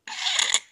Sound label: alien, creature